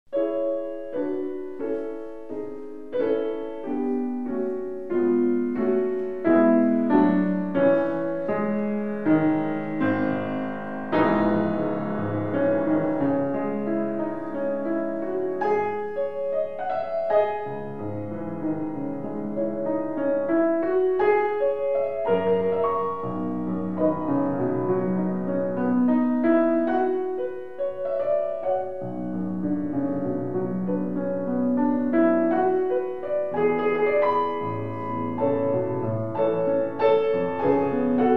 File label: Chopin Piano Recital Prelude op.45 Pianist live